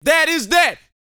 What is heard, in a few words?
VOX, Vocal, R&B Dance, HIP-HOP